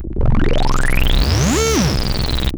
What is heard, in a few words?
Roland C Noise, Roland S100; musicradar-synth-samples